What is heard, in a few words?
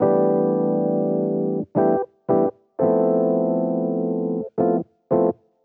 Jazz Keys El Piano 85 EF, Chordy Loops, 85bpm